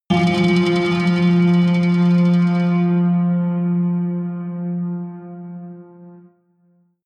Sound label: synth hit rattle high